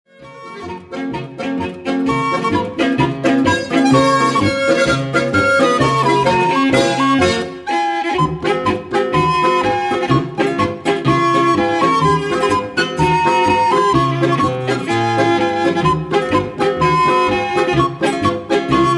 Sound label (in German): Reise Achterl Polka schnell